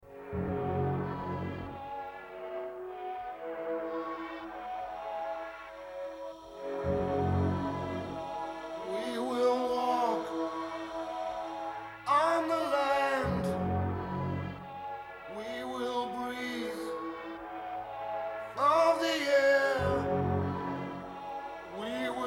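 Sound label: mellotron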